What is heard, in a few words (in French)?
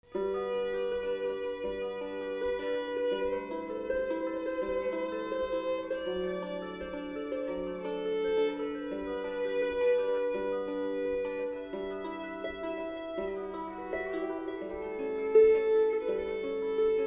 Violon Harpe